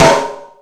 All Snares, Drum Machines

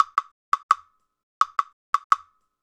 Wood Block; Sonic Foundry (Sony Creative Software); Joe Vitale Latin Percussion; Wood Blocks

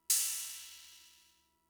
Cymbal, Fricke MFB-522, Drum Machines

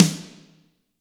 SN AMB, AMBIENCE SNARE

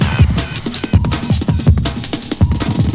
breaks 162

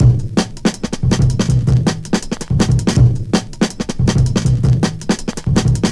162, breaks; M8

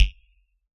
Analog Snaps, Yamaha DJX; 155 DJX Kit

